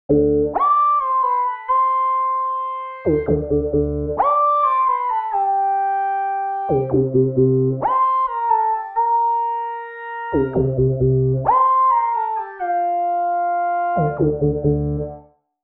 Tripe Solo

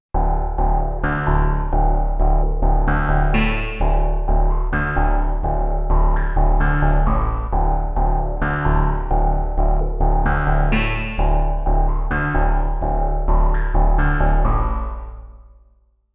Velo Resonance

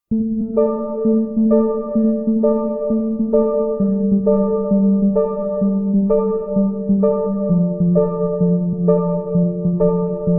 Celesta

